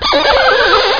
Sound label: Use Wiper; Bublz-Lite